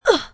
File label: hit; sorc